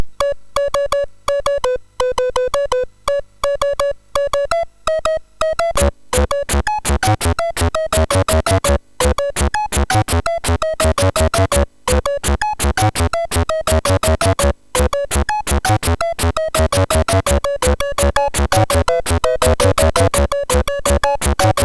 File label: Flute Fun, MOS6581R3; HVSC